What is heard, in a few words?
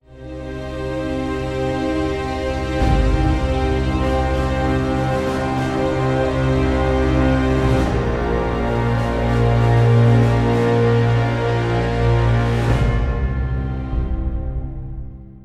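sting death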